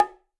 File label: Bongo Open; Bongo A H Open 1F; Latin Percussion; Drums; Drum Hits; Ableton; Factory Packs